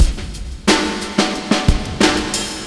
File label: drums with reverb